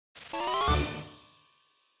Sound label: Success; Shutter Speed; WarioWare: Get It Together!